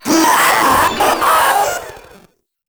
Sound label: Proto Infested Runner Vocal Attack; Warframe